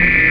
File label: laser; svencoop; keenhalloween